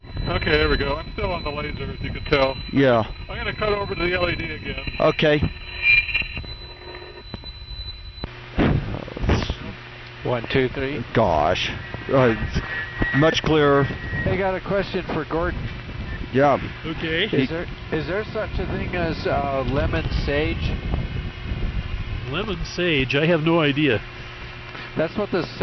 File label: laser led cutover, optical comms; insipiration